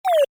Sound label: Menu SFX: Go Back; Buttons; Multimedia; Stuart Duffield